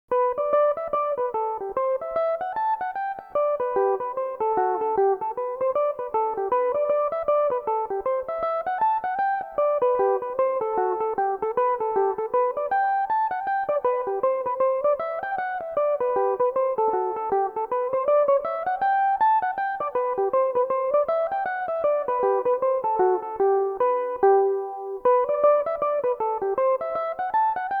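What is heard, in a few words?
march sky g major, mandolin; The March Sky; Belmuse EM-190 mandolin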